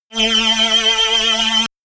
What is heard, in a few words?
shot annoying tooth; zaps buzzes